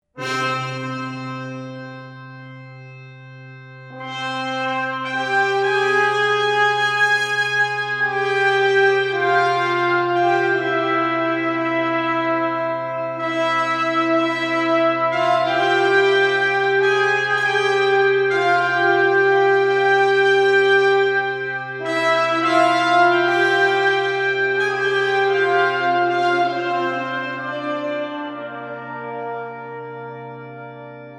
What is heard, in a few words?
Scandinavian Chant, Brass Ensembles